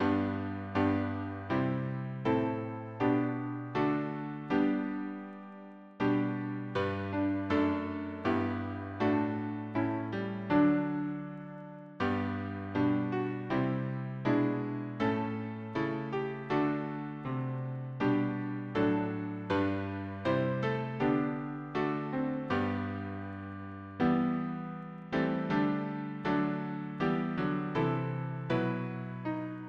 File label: harmony piano